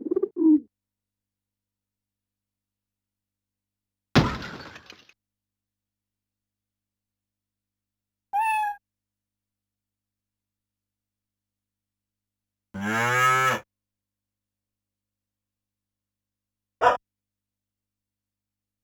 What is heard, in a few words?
Yamaha DD-65 SOUND FX